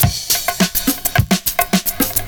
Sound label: PERCS, 70 s Breakbeats